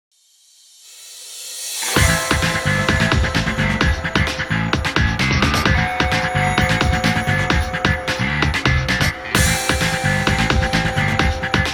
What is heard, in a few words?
tension